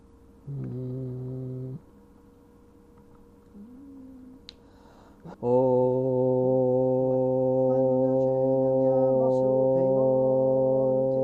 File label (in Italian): Inno dei segnavia, basso; Rosso Bianco Rosso; C.A.I.